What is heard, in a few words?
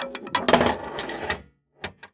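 Drain, pinball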